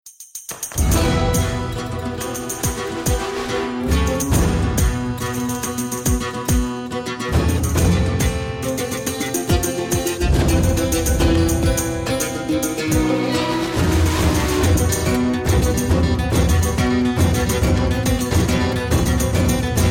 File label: start round